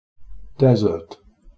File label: desert